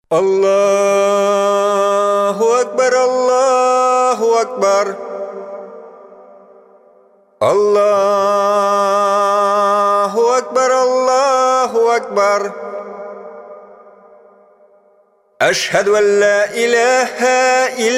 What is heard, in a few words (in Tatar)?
azan chyn tatarcha azan